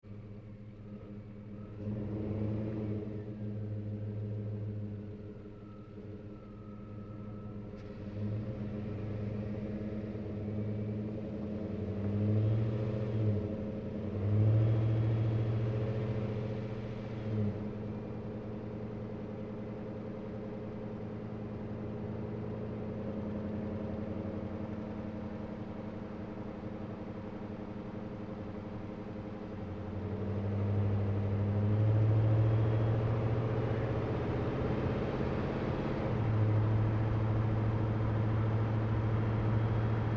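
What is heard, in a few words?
Truck GMC Savana Onboard Drive Accel Decel Medium; Trucks; Big Room Sound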